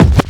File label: Hip Scratch